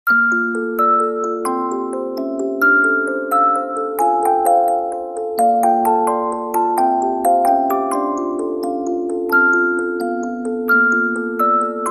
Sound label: Music Box; Anime; Tokyo Ghoul:re